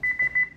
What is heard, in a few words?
door beep